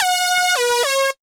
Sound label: Beat